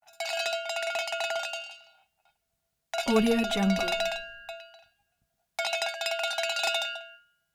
cow bell rapid rings toggling bongs

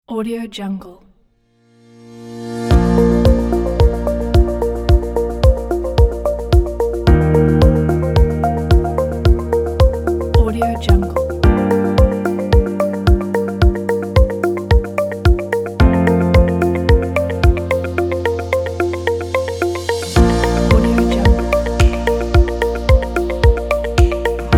soft inspiring commercial corporate music pack

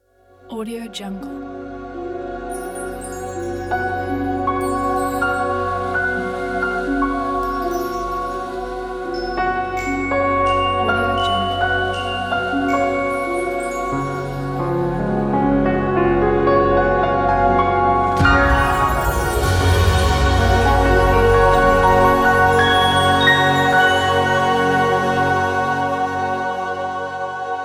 magical mysterious piano intro

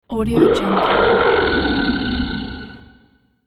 fantasy dragon of might

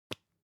object hygiene lipstick open soft

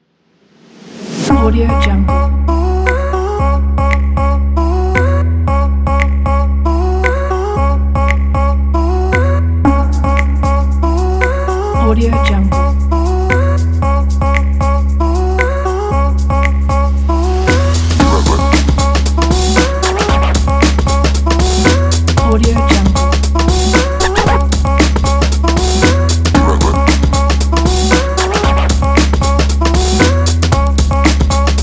stylish drum bass rhythm

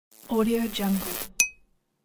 lamp burned out; sounddream

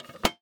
small metal box empty close

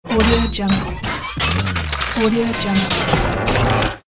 robot transformation

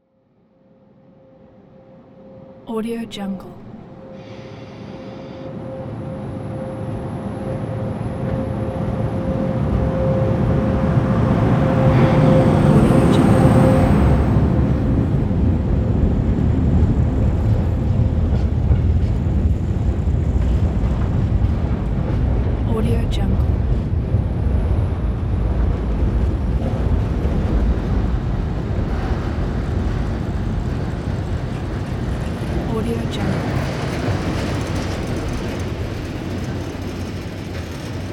transportation train freight stop short

